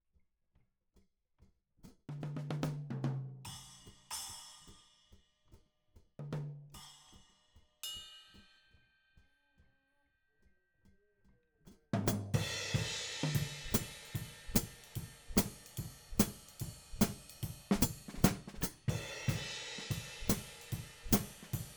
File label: Hat